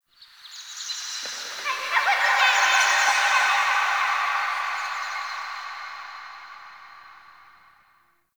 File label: Zariman Park Memory Echoes; Warframe; The New War; Ambience